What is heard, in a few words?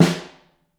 SN Overhead, Snare